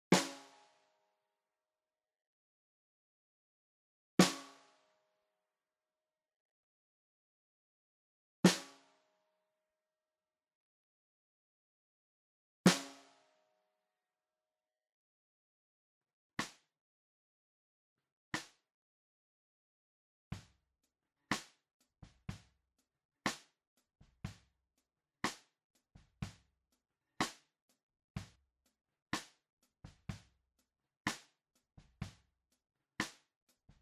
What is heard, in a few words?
Snare bott mono